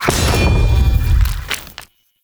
Spear Impact

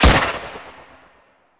Weapon Shot; Fairy

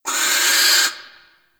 Owl Critters Vocal Alerted; Ambience; Warframe; Duviri

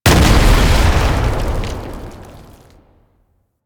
Tank Infested Swipe Impact; Lasrian Tank; Abilities

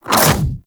Infested Splitter Melee Swipe Small

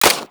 Fire Mech, Weapons; Lato Redux

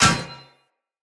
Path Lantern Impact, Hanging Lanterns; Dendrit

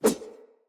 Dagger Whoosh; Weapons; Player; Swords; Josalfar

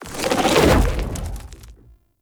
Summon Fire; Warframe; Uriel; Demonium

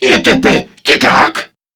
Surprised Crewmen

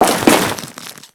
Crewman Land Ice, footsteps; Enemies; Warframe; Corpus

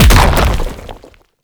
Hand Bomber Proj By Explosion; Warframe; ManInTheWall

